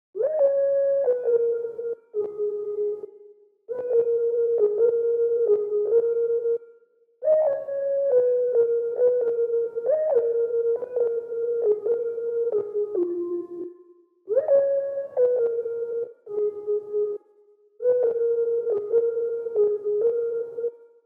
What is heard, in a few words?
68 BPM C# Min Sine; Cymatics; Serenity